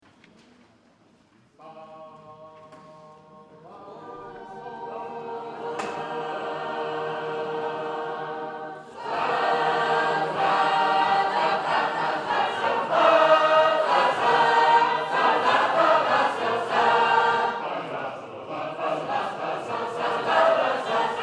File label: Travelling On, sacred harp